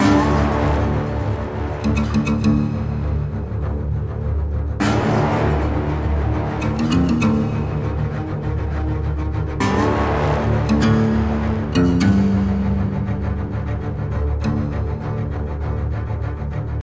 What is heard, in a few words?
Wilderness; Call of Juarez Gunslinger